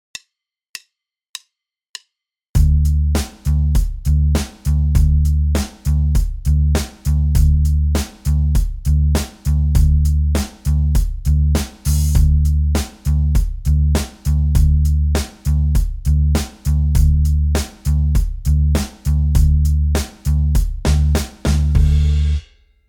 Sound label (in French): contretemps et syncopes binaires tempo 100